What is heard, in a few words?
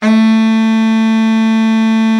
BARITON, Baritone sax; Best Service; Killer Horns